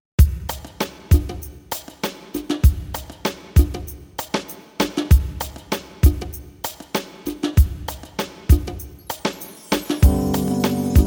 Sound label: rhodes, 432 hz